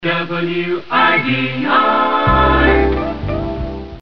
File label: JINGLE